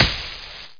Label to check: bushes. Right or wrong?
Right